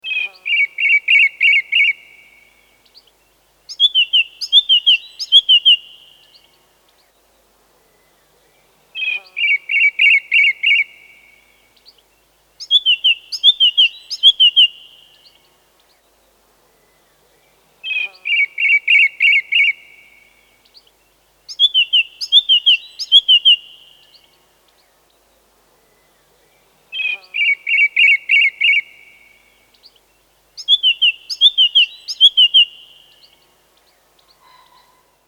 Song Thrush; Vertu; Signature-Touch

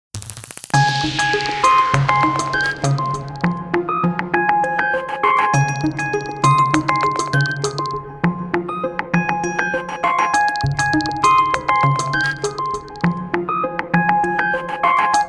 Nokia Tones; Resonator; Windows Phone apps

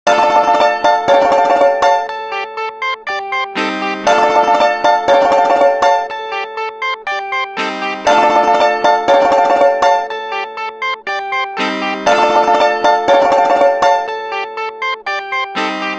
Bandola, ringtones

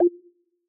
mic closed Announce; Apple iOS 16

